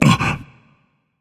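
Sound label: non-verbal notice; smite; atlas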